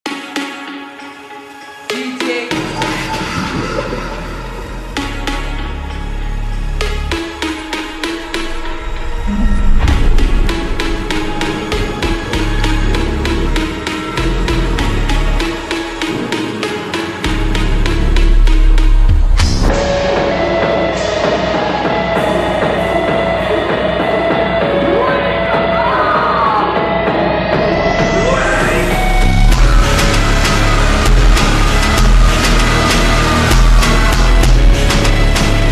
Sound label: slowed reverb